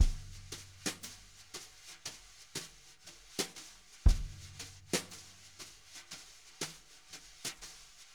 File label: jazz brush loops